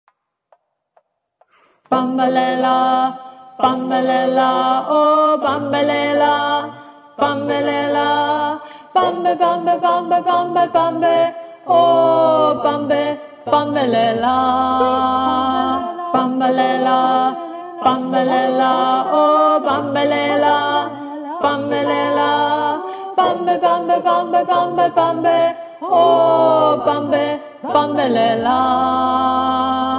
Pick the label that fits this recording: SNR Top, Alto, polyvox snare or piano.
Alto